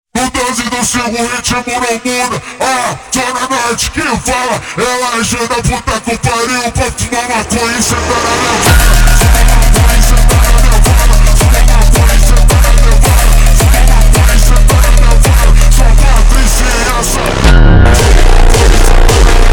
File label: hardstyle Slowed